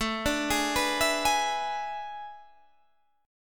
A Major 7th Suspended 2nd Suspended 4th, Guitar, Standard; arpeggiated